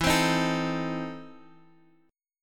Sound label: E Major 7th Suspended 4th Sharp 5th, Guitar, Half Step Down; EM7sus4#5